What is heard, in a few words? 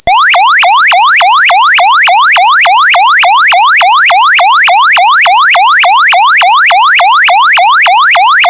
ALERT, siren